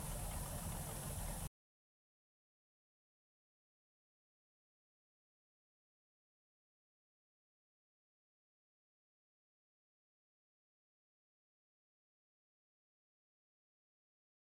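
Scarab 34 onboard startup accels shutdown engine back wall, Wellcraft Scarab 34; Pole Position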